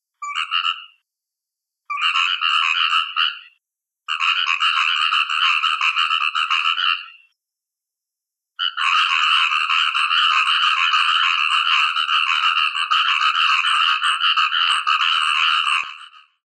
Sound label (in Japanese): numa gaeru